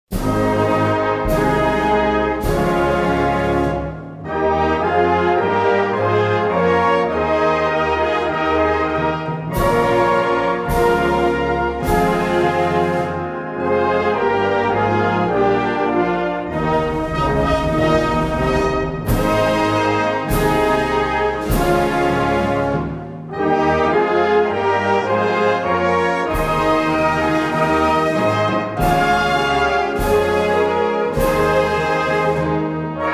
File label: russian anthem